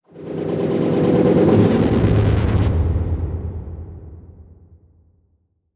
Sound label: Transition Tremolo; Production Element; Title Transition; AdobeCC